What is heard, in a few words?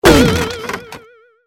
Wood Joint Break; bad piggies; android; mobile; windows